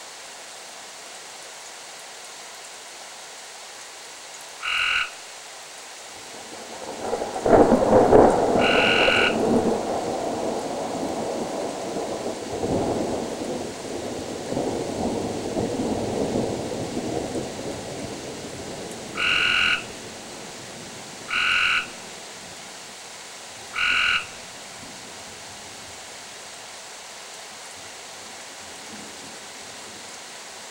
frog and thunder; FROGS

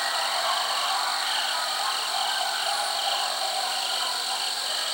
coyote calls; MAMMALS

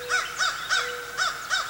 american crow; BIRDS

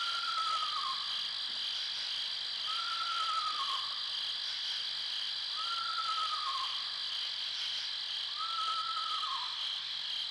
screech owl; BIRDS

